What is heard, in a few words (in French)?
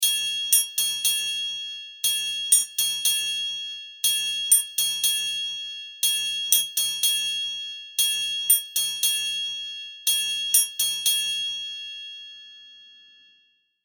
impro triangle; loto sonore